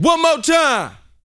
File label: VOX, Vocal, R&B Dance, HIP-HOP